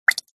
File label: nature water single drip